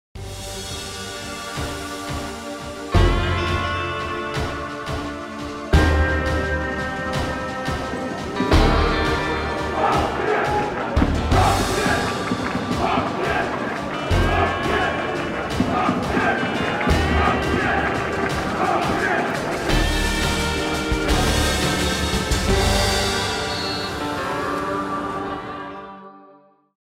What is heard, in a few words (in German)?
klingelton